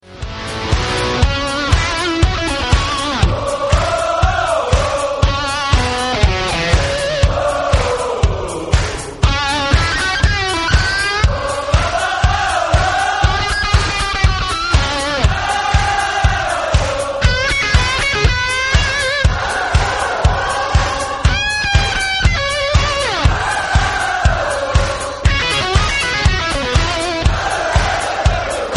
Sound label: Crowd Chant